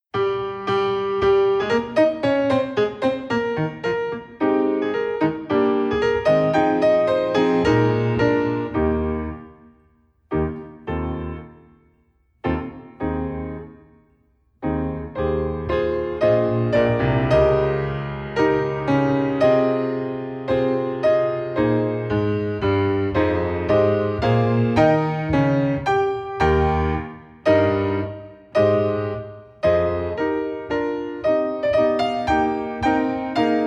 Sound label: Bb Accomp, Accompaniment Bb; instrumental solos